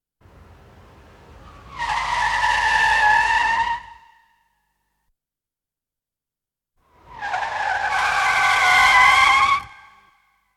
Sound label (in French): Coup de frein